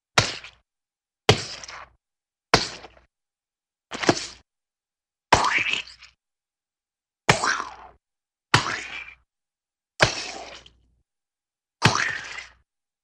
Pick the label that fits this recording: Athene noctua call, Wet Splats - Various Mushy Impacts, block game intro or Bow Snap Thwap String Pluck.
Wet Splats - Various Mushy Impacts